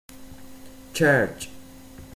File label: church, spain